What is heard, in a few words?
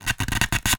Polystyrene Squeak